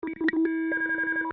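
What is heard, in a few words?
control tone; KATANA; Daikatana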